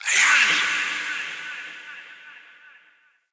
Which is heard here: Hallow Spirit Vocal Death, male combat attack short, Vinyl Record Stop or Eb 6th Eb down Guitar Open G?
Hallow Spirit Vocal Death